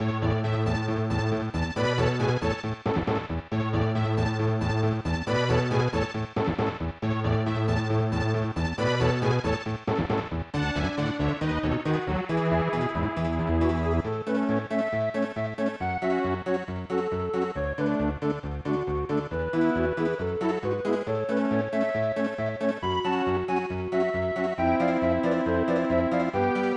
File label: Polka; Aretha 2 Ariel no Fushigi na Bouken; SNES